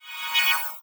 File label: unicorn off; Politburo